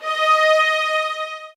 D, soft violins; Q Up Arts; Denny Jaeger Master Violin Library

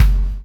CHAIN; Spectrasonics - Backbeat

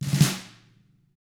TAMA drums; ILIO; Double Platinum Drums 1

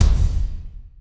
tech fx; Best Service; Hyper Dance; EFFECTS

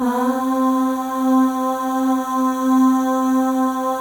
AHS, FEM; Spectrasonics; FEM.1 AHS

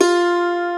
irish harp F3; Proteus3

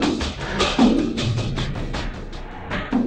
Elektro Cym, drums; Sonic Foundry (Sony Creative Software); Friendly Fire

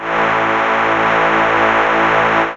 CHORD, DRONES & PADS; 90's Sample CDs

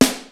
XD-5, DRUMS PERC, BOSS; Rubber Chicken Software Sample Bank

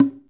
small drums; DRUMS & PERC

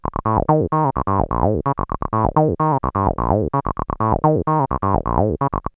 303 Loops; X-Static Goldmine

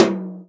tom, Real Drum Kit, jungle kit

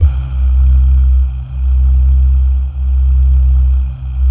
BAH, BAHS GUYS; Spectrasonics; Vocal Planet; Jazz FX

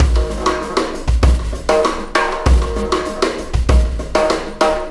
Alien Influence Beat, Processed Drumkits

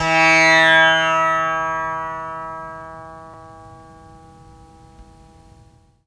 Indian Percussion Tabla; Mango Loops

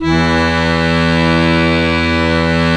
ACCORDION E2; USB Soundscan vol.63; Balkanish Traditions; AKAI

